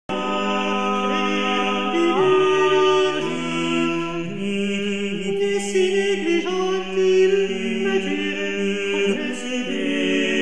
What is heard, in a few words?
motet; Philippe de Vitry; In arboris-Tuba sacre fidei-Virgo sum